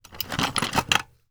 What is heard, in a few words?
Chest Unlock Small; Game Essentials